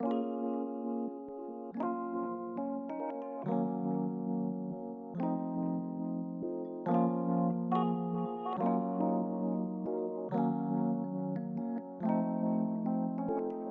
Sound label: 140 bpm A m Chords Melodic